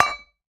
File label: Ball Collision Holiday Mug Hard